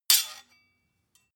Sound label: sword hit scrape